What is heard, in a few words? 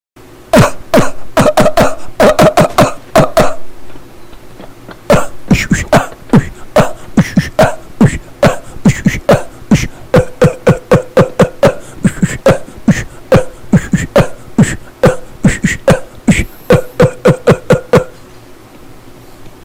Bitbox